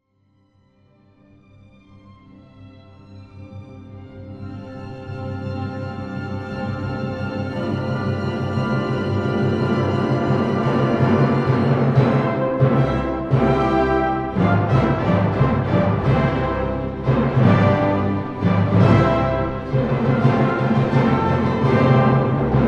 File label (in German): Beethoven 5te Sinfonie 3ter Satz Allegro